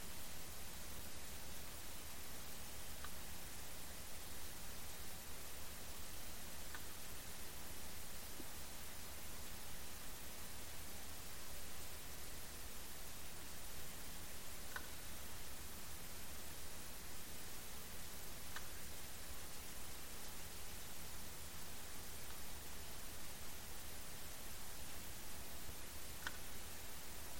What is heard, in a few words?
clicks varied intensity; NAXYS HYD